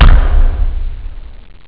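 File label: bass drum; ST-XX Amiga Soundtracker Sample Packs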